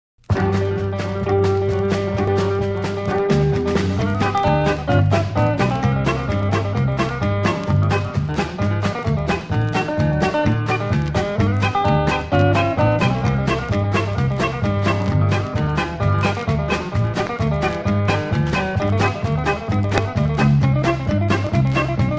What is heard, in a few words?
guitar (instrumental)